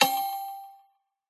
multimedia game sound hit metallic ring score target